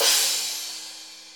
Cymbals

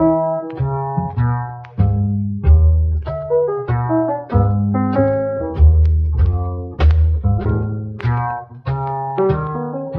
piano and upright bass bolero, Loops; Phonky

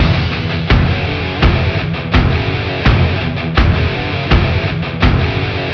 guitar, Jazzmatazz Loops

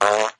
fart short

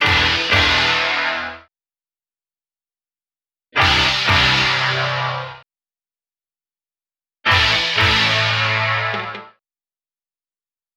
guitar rock anthem, Loops

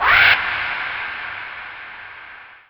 wap, Zaps and Beeps

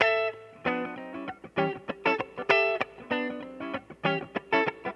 guitar, Loops, Phonky

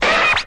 scratch sword

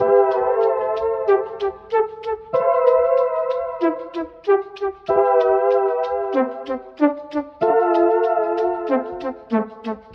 keys, Phonky

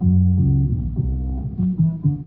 bass, Loops

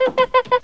chimpanzee; Animals

